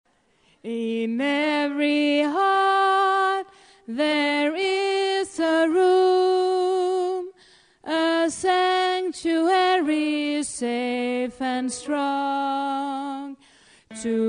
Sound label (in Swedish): alt, stammor